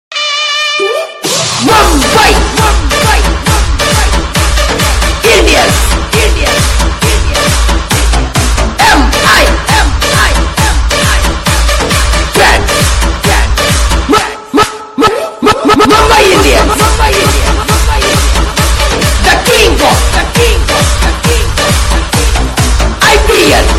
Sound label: Mobile Ringtone